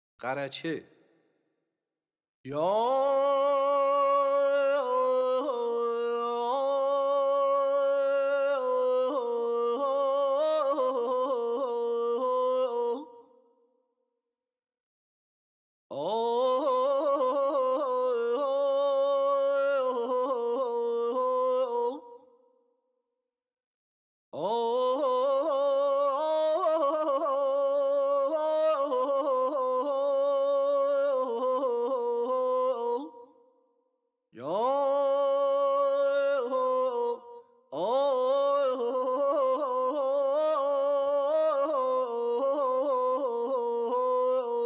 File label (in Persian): Gharacheh Shur Radif-e Karimi; Dastgâh Shur